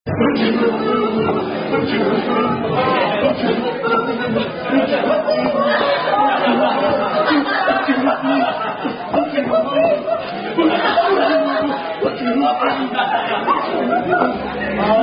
Boom Chicka Boom Boom Boom Boom, Gesture Circle Game